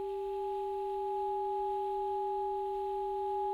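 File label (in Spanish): Multiarmonicos, saxofón multifónico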